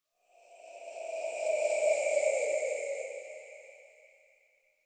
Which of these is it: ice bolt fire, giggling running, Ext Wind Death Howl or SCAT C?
Ext Wind Death Howl